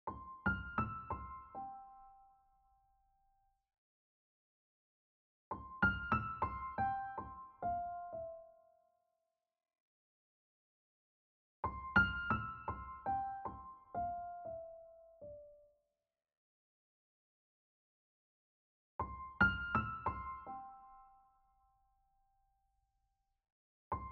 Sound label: Sketching Piano